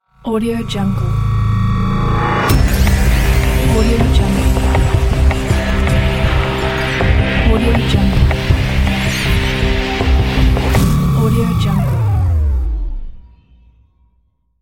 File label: dark machinery logo